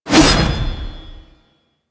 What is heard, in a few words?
Flame Spear Throw Fire A, Grineer, Weapons